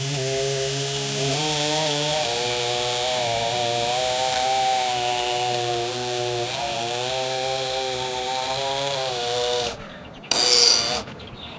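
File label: scythe cutting through grass; agriculture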